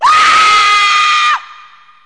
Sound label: alert; slender; slendytubbie